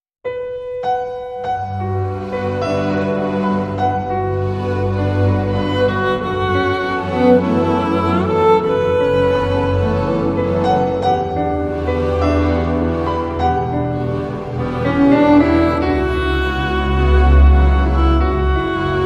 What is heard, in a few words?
Melody With Piano & Violin